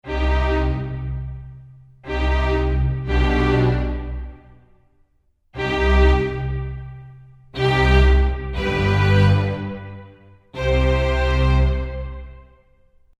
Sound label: Strings; EastWest